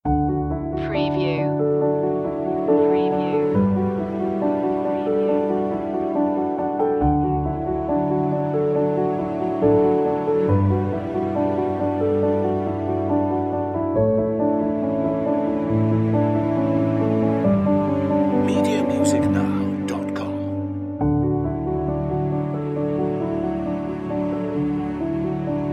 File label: pure love, wedding